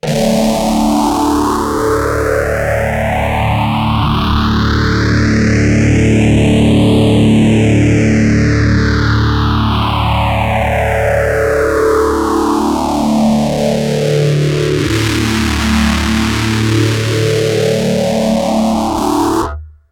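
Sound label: Rat guitar, Moogerphaser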